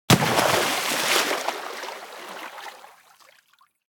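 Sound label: splash large; smite; poseidon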